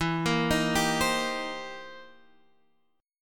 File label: C Minor 7th E; Cm7/E arpeggiated